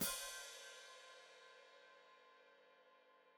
Hat Closed Open, Hi-Hat

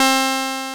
PULS WAV HI, INST WAVES

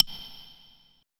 E HIBLOCK, ETH PERC

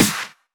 Snares; Vengeance - Essential Clubsounds Vol.4

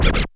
Scrach, Percussion; Yamaha DTXpress

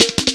Hard Stepz Cut, Drum Loops 166.5; Zero-G Jungle Warfare 1, 2 & 3

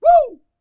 A YELL, MALE HUMAN; ILIO; Vocal Planet

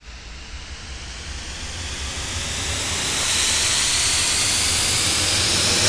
sfx sci fi; Masterbits - Switch FX

